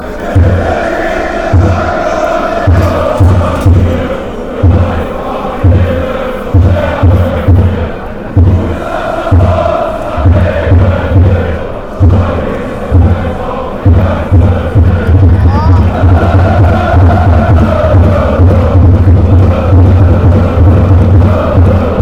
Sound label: whole world, fan chants